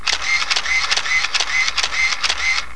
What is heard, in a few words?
camera motor